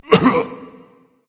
grunt hit berserk; cuchulainn

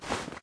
footstep cloth; guanyu; smite; hades

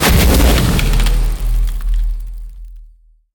ice; smite; merlin

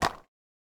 skateboard land light; smite; mercury

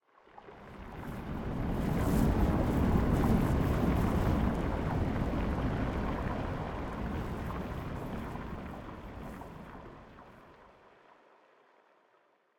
victory surf; smite; pele

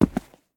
Footstep; smite; raijin

